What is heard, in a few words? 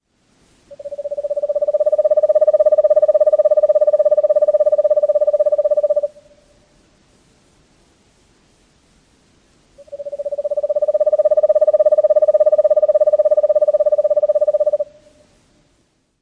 monotonic trill